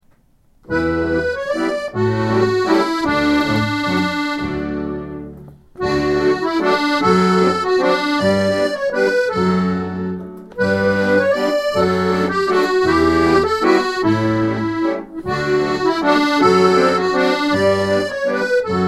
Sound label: waltz